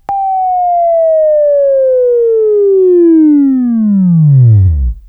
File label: Buzz, Left; Sweeping Training